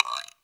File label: GUIRO, PERC; SoundFactory